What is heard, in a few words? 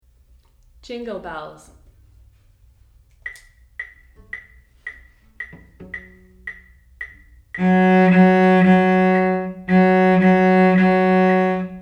Jingle Bells, cello; 1st position